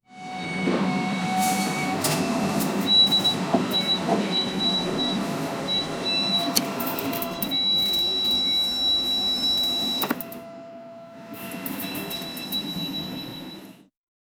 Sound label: the sounds of a robot, smoking